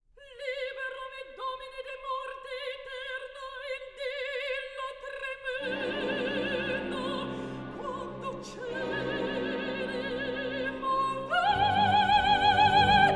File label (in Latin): Libera Me, Requiem; requiem verdi